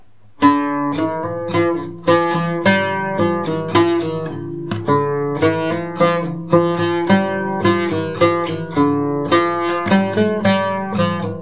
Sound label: oud strings horn risha; khalifeh